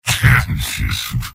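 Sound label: laugh short, male; soldier; tf2; garrysmod; mvm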